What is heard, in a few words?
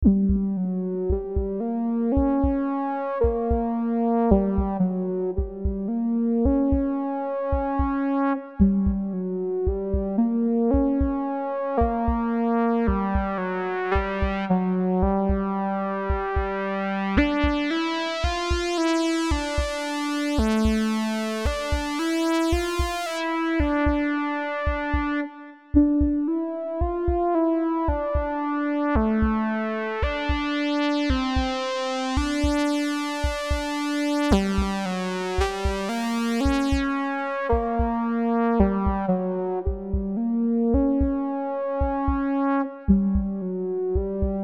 Cave Exploration